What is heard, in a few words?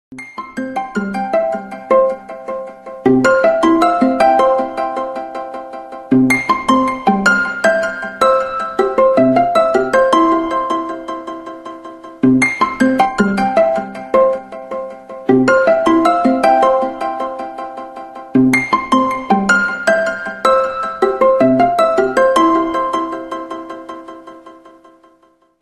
iphone ring raining wake up